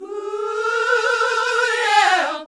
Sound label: HAL GOSP. MIXED; Sound & Vision - Gigapack II CD 1 (Roland)